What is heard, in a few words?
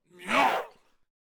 Dark Peasant Attack Vocals